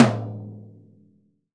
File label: POP M. TOM; VELO TOM 1